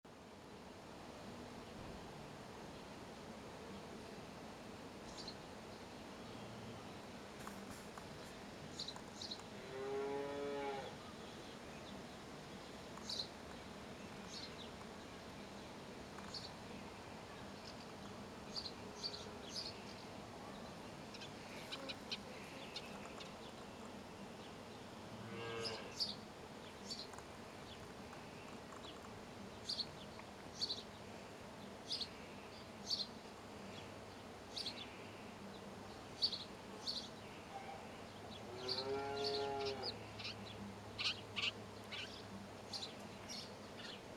Passer domesticus; SETE

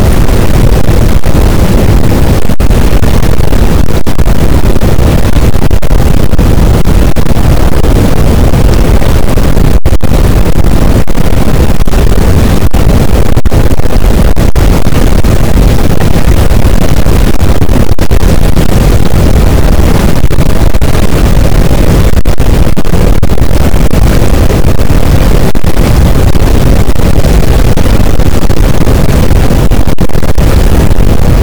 cachalot, boat; Physeter Macrocephalus; SNR 0dB

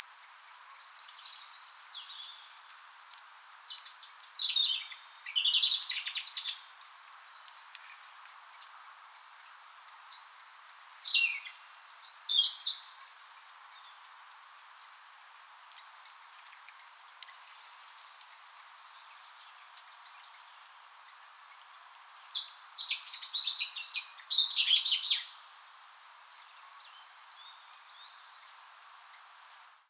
Erithacus rubecula; SETE

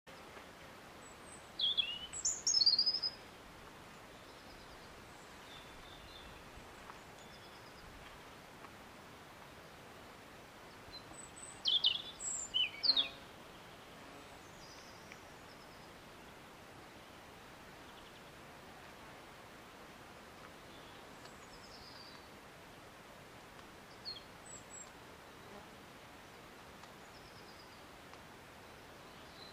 Erithacus rubecula; SETE

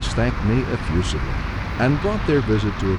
noisy

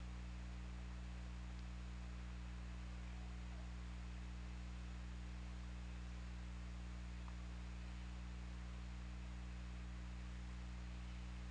Orca; OrcaLab

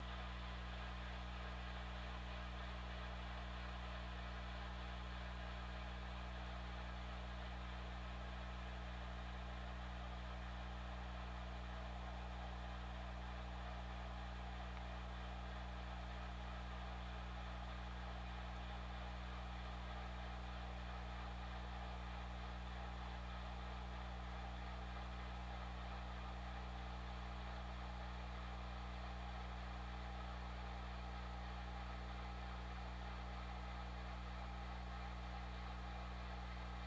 Orca; OrcaLab

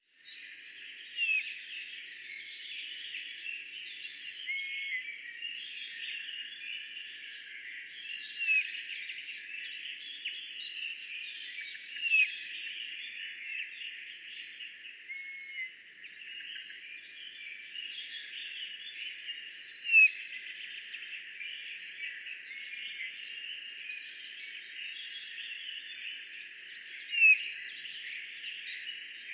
Pyrrhula pyrrhula; SETE

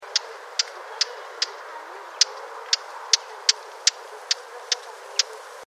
Sylvia atricapilla; SETE

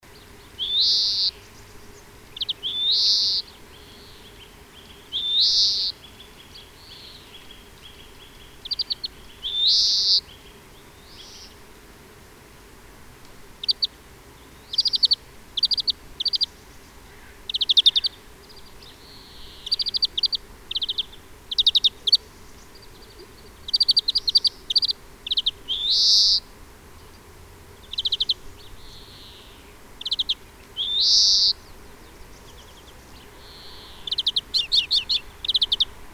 Chloris chloris; SETE

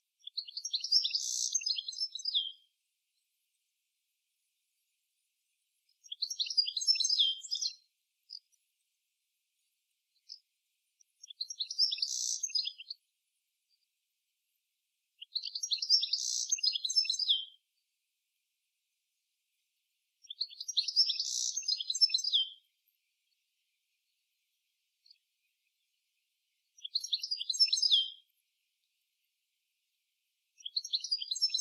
Carduelis carduelis; SETE

